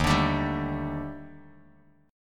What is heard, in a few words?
Eb Major Sharp 11th Eb Bass Standard; EbM#11; strummed; 11,17,19,12; JGuitar